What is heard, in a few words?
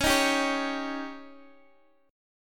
D sharp 7th C sharp